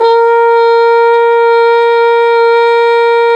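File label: brass plunger trumpet, plunger mute; Roland L-CD702; Plunger & Wa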